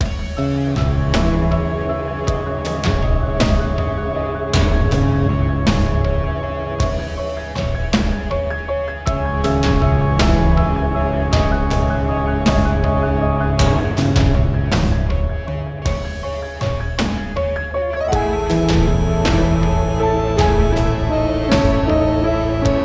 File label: Cemetery Loop